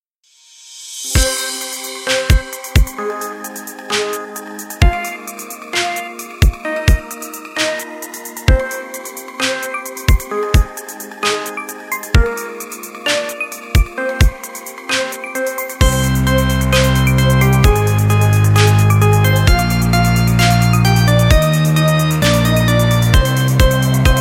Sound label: EDM